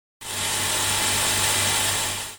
鱼塘抽水

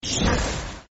雷击; 武器音效

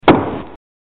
砸马桶

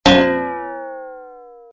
失败

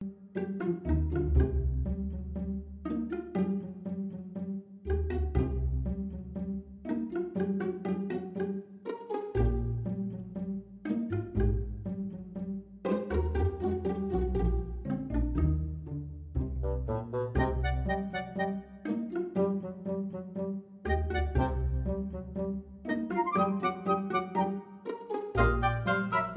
战斗背景音

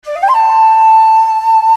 海螺声

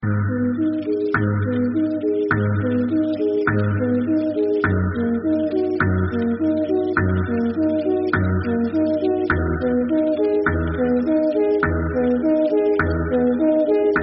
背景音乐

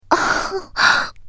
女呻吟声

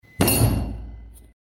拍桌子

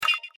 转盘

